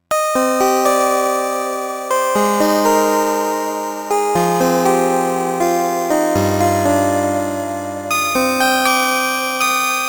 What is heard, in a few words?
Lo Fi Bell, Korg